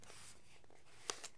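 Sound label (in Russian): переворот странички у длинного письма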